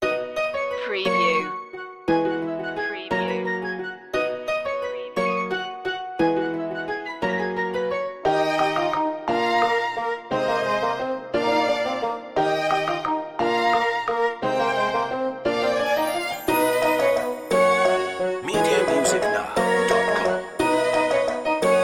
melody

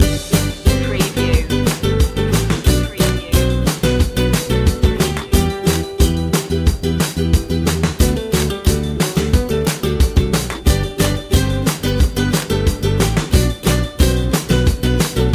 life loop

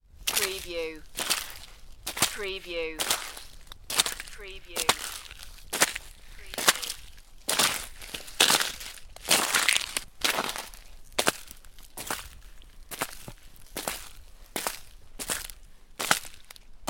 Snow and Ice, Footsteps Slush Wet Ice

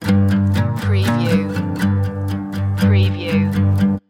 texas highway stinger

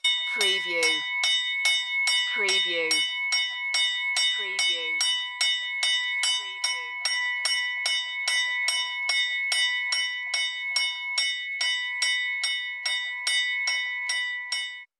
Bells Interior Bell Tower

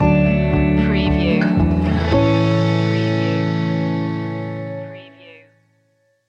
walking home stinger

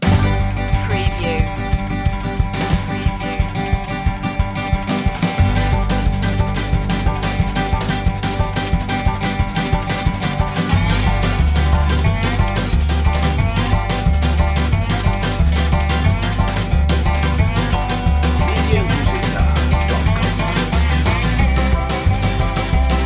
hickabilly hoedown

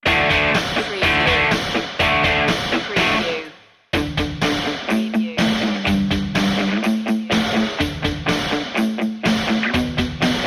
garage band

